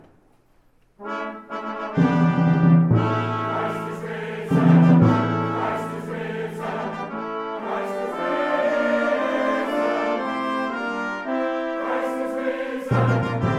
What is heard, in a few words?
Easter Fanfare